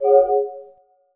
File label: ui Open